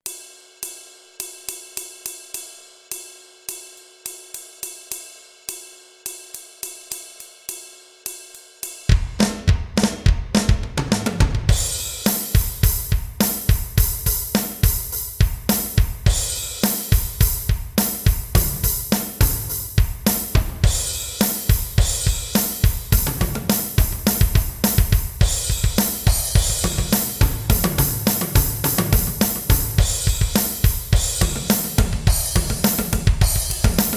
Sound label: drums and percussion; onelivingchurch